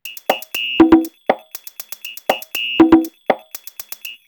120, drumloops DrumMachines; X-Static Goldmine

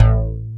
M80 BASS

